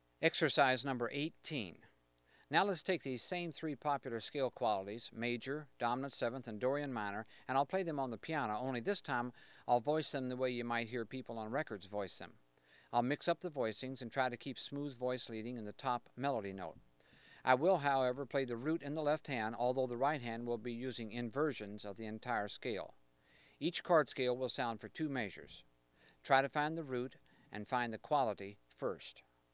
Mixed Maj 7th Min 7th Dom 7th Varied Voicings 2 Bars Each